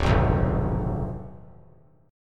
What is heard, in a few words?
D Diminished 7th D down; strummed